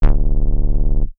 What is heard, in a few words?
bass